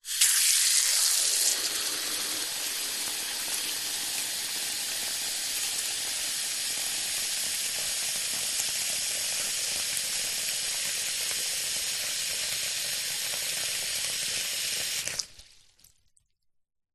household bathroom tap faucet turn on run fill

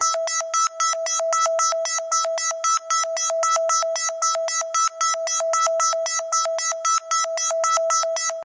science fiction alarm beeps emergency

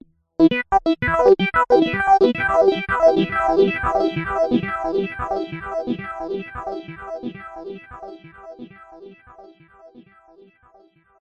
synth melody sci fi space sting; jessey drake

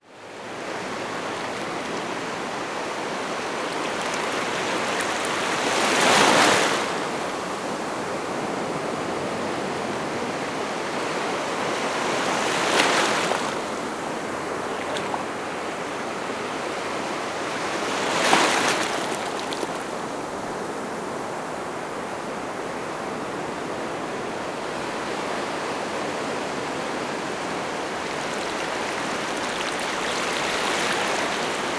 ocean waves wash up close distant surf; zapsplat

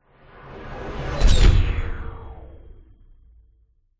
sound design whoosh sci fi pass hit; zapsplat

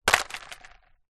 vehicle car hubcap plastic land in road

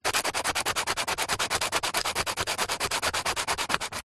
industrial sand paper sand plastic rubs

